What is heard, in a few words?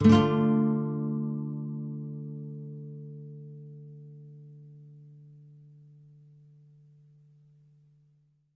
Nylon Chord Dmin, Nylon Guitar Chords